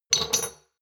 shot glasses pull out hard, gastronomie; berufe; soundpool

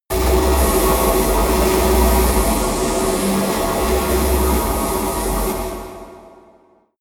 space alien energy plant high loud busy; comedy-cartoon; elektronisch

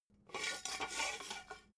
metal scaffold pole slide; industrie-wirtschaft

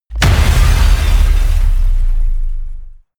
smash, baugewerbe

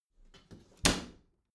bathroom cabinet close heavy; objekte; tueren

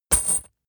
metal chain impact

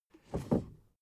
suv 1st gear release soft; verkehr transport; autos

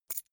key ring small catch; objekte; schluessel

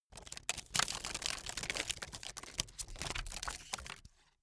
playing cards drop; comedy-cartoon; spielzeug

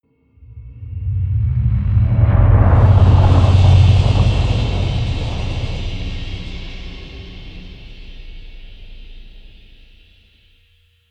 rumble rolling thunder

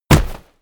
sand bag drop; impacts

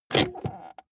car bmw e46 interior handbrake pull up fast creak

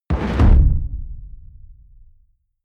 heavy sub noise hood

